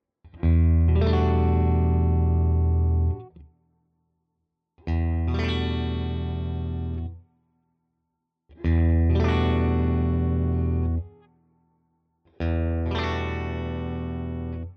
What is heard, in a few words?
clean Chords all positions; Ibanez AZ SDB